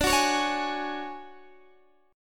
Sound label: D Major 7th Flat 5th D down Guitar Open D